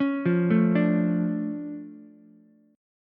D Minor Major 7th, D, Ukulele; DmM7 arpeggiated; JGuitar

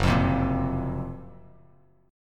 Gb Major Sharp 11th, Bass Standard; GbM#11; strummed; JGuitar

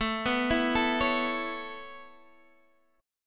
B 7th Suspended 2nd Suspended 4th A

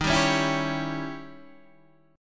Eb 7th Flat 9th Eb, Guitar Open D

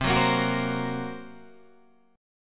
Bb Suspended 2nd Sharp 5th C

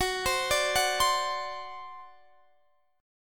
D 7th Gb, guitar

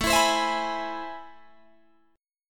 A Minor Major 9th, down, Guitar, Drop D

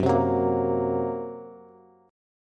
Gb Major Flat 5th, down, Bass; strummed; JGuitar

